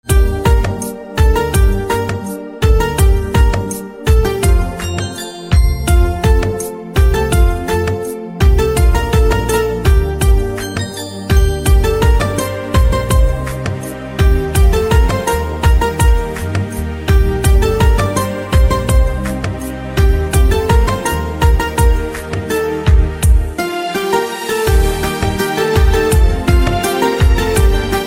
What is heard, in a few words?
Piano Instrumental Ringtone